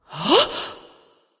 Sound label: surprise; smite; arachne